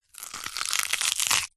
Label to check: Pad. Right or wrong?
Wrong